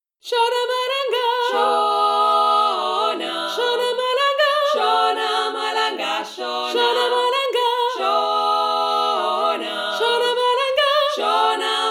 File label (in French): 4 VOIX